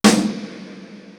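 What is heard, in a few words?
AMBIENT SNARE; East West; Global Sounds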